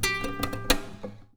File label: Classical Guitar Double Note Plink; Classical Guitar Extended Technique Stereo